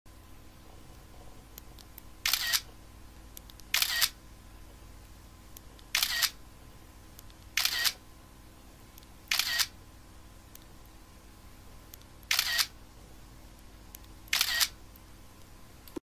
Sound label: iPhone Camera; عکس گرفتن آیفون